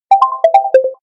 front camera rhythm down; Allview Soul-X6-Xtreme

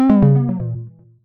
Battery Low; BlackBerry; Priv; ui

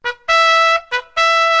Reveille; Sony Xperia Z2

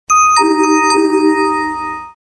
new email

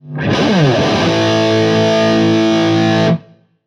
Rock, notifications; Marshall; London KB 1501

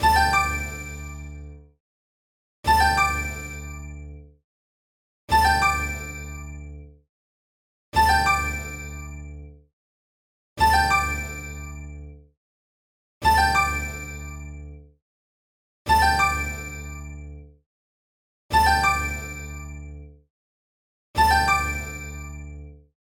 Jingle; TCL; REVVL 4 Metro

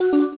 Movie Stop, Camera; Motorola; ZN40

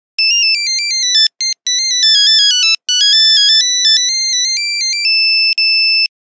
1100 , Nokia Buzzer; Windows Phone apps

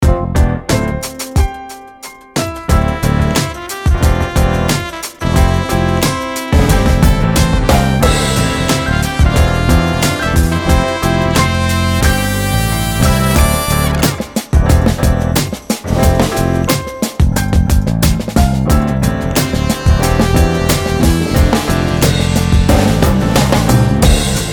Groovy; The Voyage variants

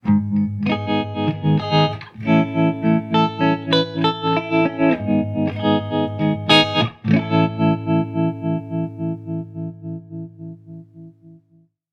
Soft Rock, ringtones; Marshall; London KB 1501

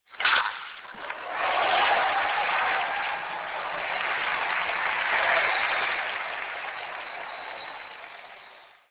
Alarm; Audiovox CDM 9500

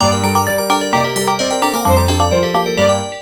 SMS GirlText; Acer Liquid E1

